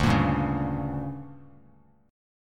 D-Major 7th Suspended 4th Sharp 5th, Bass Standard; DM7sus4#5; strummed; JGuitar